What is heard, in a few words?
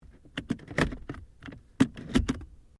Car Ford Focus Gear Change When Off; Ford Focus hire car; Cars; Vehicles